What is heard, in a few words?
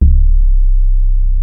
Sub Sonic Bass C2